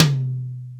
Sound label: Akai XR10 Mid Tom; Drums